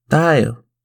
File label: Chorus voice